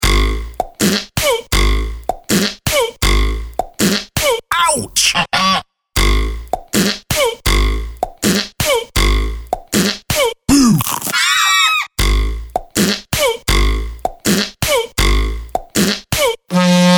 Madhouse Crazy Sound Collage, Zany Mad Crazy Montage of sound effects.; Geese; Animals